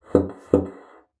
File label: BASSOON; Xsample VOL-6